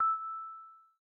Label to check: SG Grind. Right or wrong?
Wrong